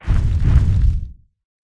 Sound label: landing; Smite; Zhong Kui